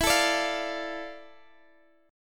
D Minor E, chord; Dm/E strummed

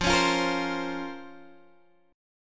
Db Major 13th F

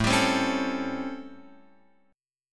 A 7th Sharp 9th, Guitar, Open F; A7#9; strummed; JGuitar

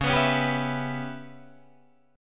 C sharp Minor 7th Flat 5th C sharp Guitar Drop D

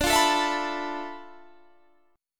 D Minor 6th Add 9th D, Guitar, Standard; Dm6add9 strummed; JGuitar

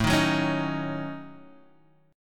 Eb Minor 7th Flat 5th A

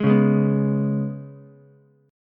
G sharp Minor Double Flat 5th G sharp, Ukulele; G#mbb5; strummed; JGuitar

